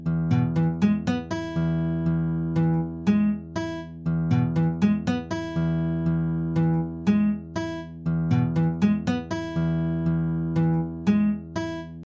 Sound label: whole note down